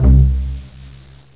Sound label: CELLO C; MUSIC; INSTRMNT